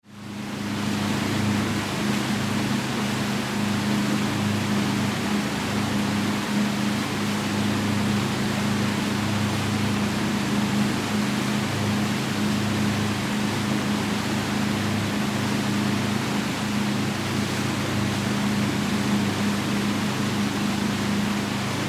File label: Yacht Drive Stern; Transportation Watercraft; Boat Sound Effects; Boats (Sound Effects:Vehicles); Blastwave